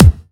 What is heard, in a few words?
bass